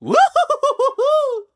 into ceiling; Collector

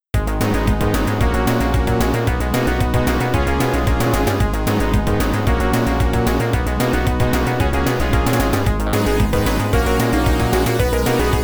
Battle; Pumpkin Adventure 3; MSX2

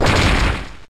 Smack Down

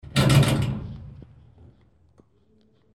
Emergency Stop

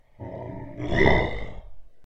Attack short

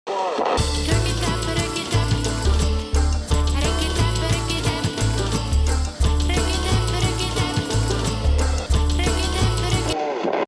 Clock; Chuchel

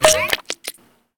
Eyeball Bounce; Ruins

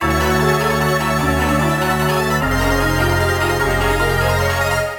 BGM STAGE BATTLE; Phantasy Star Zero

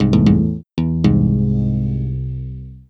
Tombstone Melody; Haven Forest

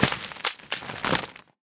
Grim Cemetery Summon Gravel; Fallen Ones

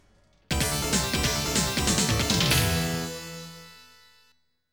Progress ROUND CLEAR; mirai ninja g.s.m. namco 1

